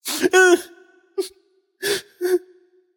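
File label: nonverbal boohoo; smite; voicepack; merlin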